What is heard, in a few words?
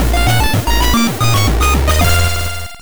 Minigame Win; Shantae; GB